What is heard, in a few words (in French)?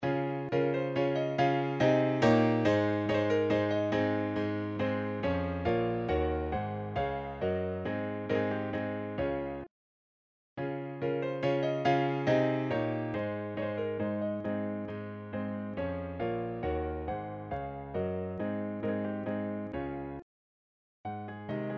Valse Pour Piano; Ludwig van Beethoven